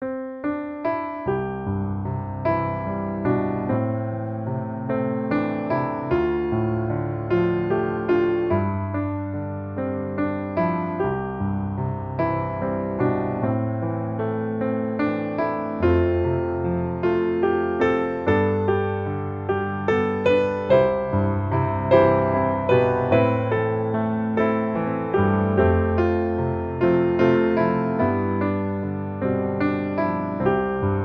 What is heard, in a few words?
piano